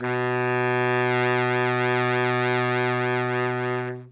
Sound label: notes, sax; Dirt-Samples